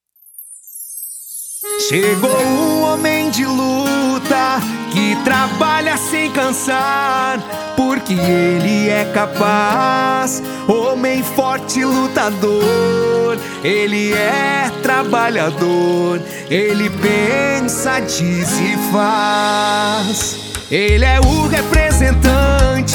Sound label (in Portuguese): Jingle VEREADOR; Homem de luta; Gil Bezerra; RENOVACAO